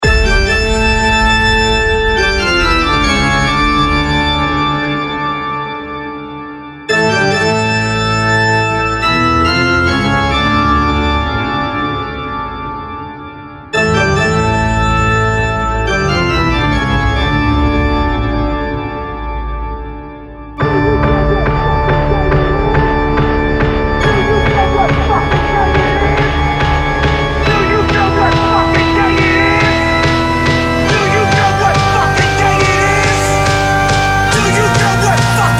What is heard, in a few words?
Halloween Trap Anthem; Dirty